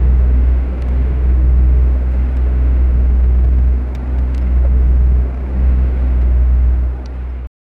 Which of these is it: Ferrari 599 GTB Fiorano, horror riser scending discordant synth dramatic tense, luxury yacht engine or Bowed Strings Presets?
luxury yacht engine